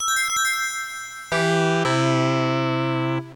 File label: Sega CD - Game Start